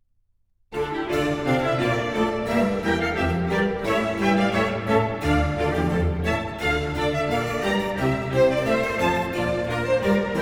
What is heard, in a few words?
Oboe Concerto in D Minor; J.S. Bach